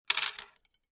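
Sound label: knitting sounds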